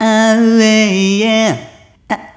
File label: Rhythmic A